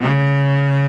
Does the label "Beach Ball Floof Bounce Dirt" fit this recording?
No